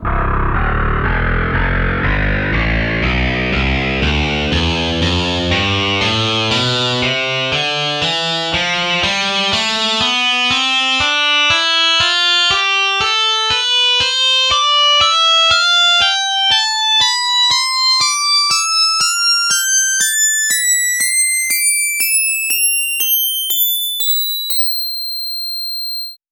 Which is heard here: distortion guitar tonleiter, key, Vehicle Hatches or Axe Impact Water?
distortion guitar tonleiter